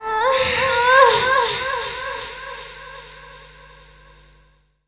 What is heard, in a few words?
Blue Vox - 8A; Jungle; Vocals; Blu Mar Ten - Jungle Jungle - 1989 to 1999 Samplepack